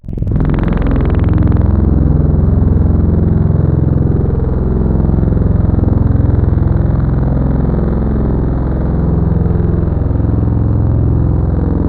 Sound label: Big Brass; Instruments; Synthetic's Serum Collection; Echo Sound Works Core Tables